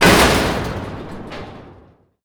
stone smash metal wall

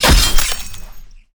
katana sword

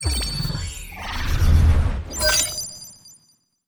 ui load online

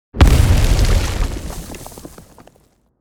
explosion stone

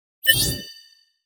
ui settlement boss sign

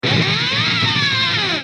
electric guitar high

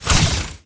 sword far

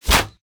bowstring rebound

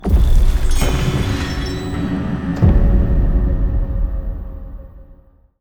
spring festival load

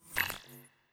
unlock

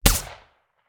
rifle

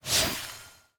p atk pickxe

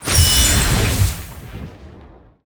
death

